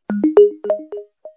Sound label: Ball, notifications